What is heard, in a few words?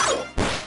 Attack, Icicle Dirk; DQIV iOS SFX